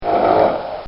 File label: Horse Grunt; Horses (Sound Effects:Animals)